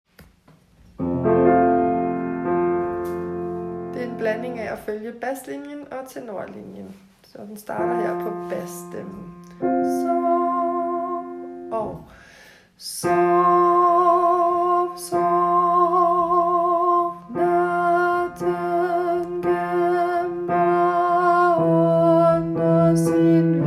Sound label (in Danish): tenor